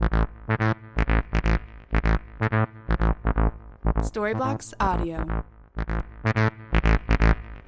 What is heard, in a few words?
Ambient House Bass 125BPM A